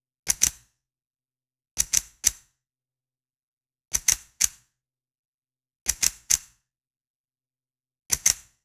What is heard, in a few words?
sound effect of a single, small room